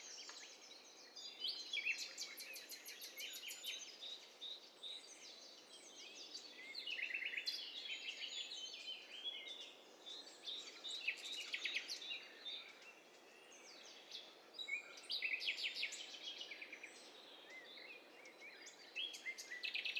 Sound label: STEREO BIRD COCKTAIL PARTY